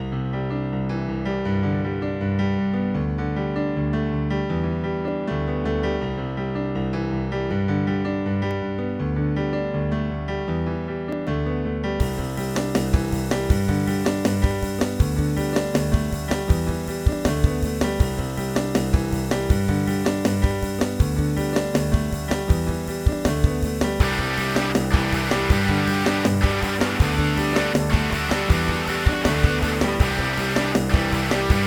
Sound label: piano; weeklybeats-2014